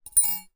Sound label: household tweezers down worktop close up; zapsplat